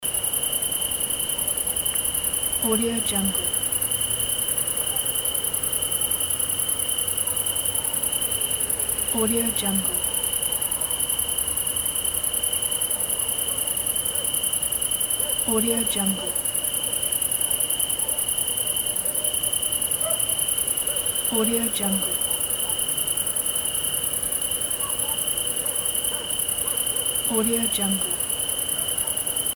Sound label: crickets